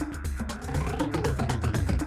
120, Classic Drum Loops

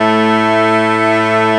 BAGPIPE DRN; Proteus3

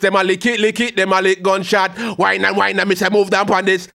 HAL RAGGAM, RAGGA-MUFF A maj

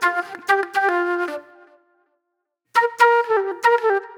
Disco Flute; Sonic Foundry (Sony Creative Software); Manhattan Flutes; Session 04 - Cruisin' Disco

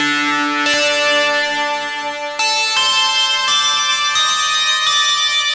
Vibes, Drum n Bass; KJ Sawka; Drum n Bass & Beyond

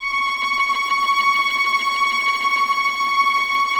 TREM F, Orchestra; AKAI Advance Orchestra Upgrade 97 Vol.1

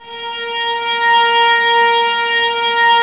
STR VAS A#, Violas, No Vib; Miroslav Vitous

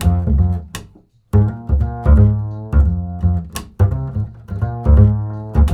acid F, 85 acid swing, bass; Spectrasonics; Bass Legends

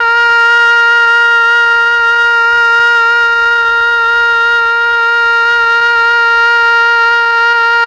MEL OBOE

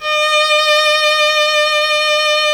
Synclavier Strings; ILIO

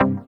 HOUSE, House Dance

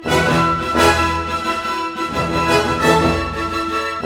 HORSE, Western; East West; Scoring Tools; ORC

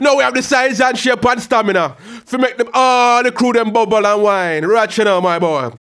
RAGGA MUFF, B maj; Sound & Vision - Gigapack II

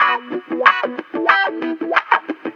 STRING; USB Soundscan vol.41; AKAI; Roots Funk

